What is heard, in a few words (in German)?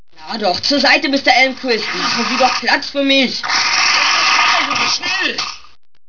feuer